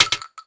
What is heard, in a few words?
12 cal shell metal; Escape from Tarkov